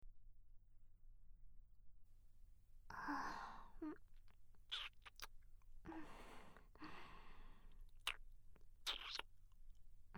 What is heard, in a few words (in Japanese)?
オホ声スイッチ強制ON！; SE大量射精er